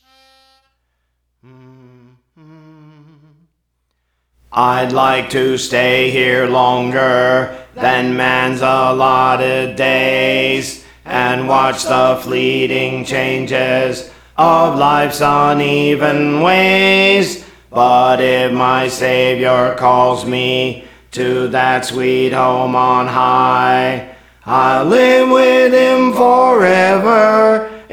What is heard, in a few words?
Bass; Praise For The Lord Training